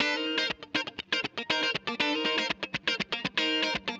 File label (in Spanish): Guitarra estereo Afinada; Melodyne